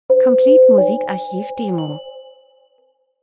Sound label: transition; Transition & Movement - hell trocken aufmerksam